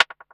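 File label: snare drum, Drum & Bass, 160 BPM; Ueberschall; Drum & Bass Resonance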